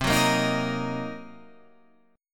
Gb 6th Flat 5th C, guitar

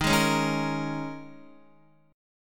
D sharp 7th Suspended 4th, Guitar, chord; D#7sus4 strummed; JGuitar

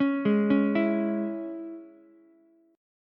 Ab Suspended 4th Sharp 5th chord, Ukulele; arpeggiated; JGuitar

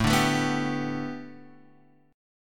B Minor 7th Sharp 5th A, guitar chord

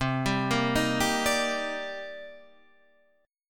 Eb Major 7th C; Ebmaj7/C chord; JGuitar

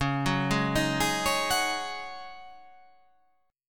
C sharp Suspended 2nd Suspended 4th, C sharp

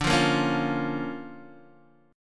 D Minor Major 7th Sharp 5th, D, Guitar, DADGAD

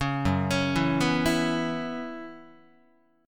Gb 7th Suspended 4th Gb down Guitar, chord; Gb7sus4 arpeggiated

